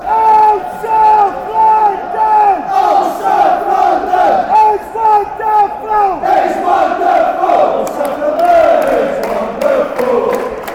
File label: oh south london, fanchants